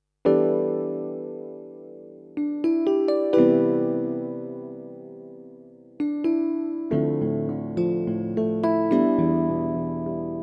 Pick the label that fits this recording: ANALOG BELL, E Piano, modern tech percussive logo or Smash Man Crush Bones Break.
E Piano